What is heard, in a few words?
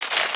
Paper Crumple; Totally Tangled